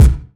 clean steel kick drum c key, kick sound tuned to the C note